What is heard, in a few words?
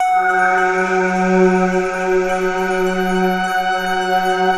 mystery pad; Optical Media International; Sonic Images Library; Top Secret